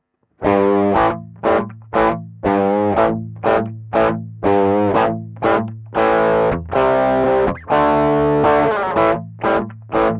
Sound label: ibanez ts-808 g12 t12